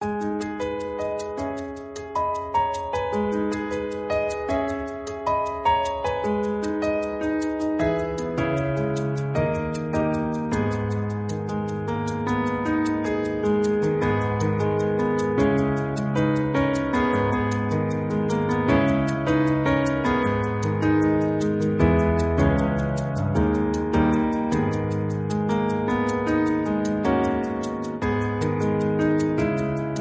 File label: Piano Cover